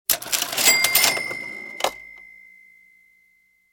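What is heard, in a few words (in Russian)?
выбивают сумму и касса открывается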